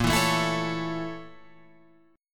A sharp Minor Major 7th Flat 5th A sharp Guitar Drop D